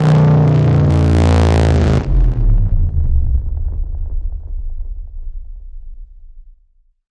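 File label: Blast Hybrid Motor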